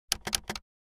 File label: Bluetooth keyboard keystroke pressing multiple